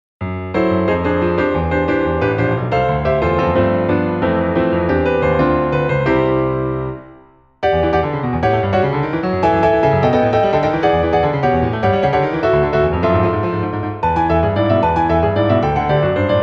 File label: piano; VS Excite Bike